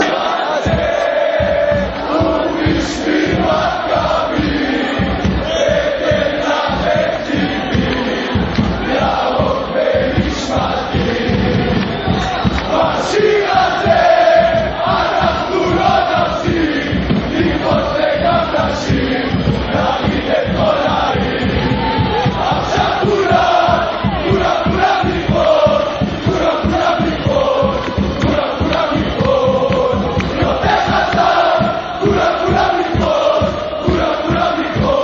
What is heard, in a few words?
everybody jump